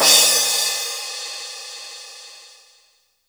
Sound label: Cym; Roland R-70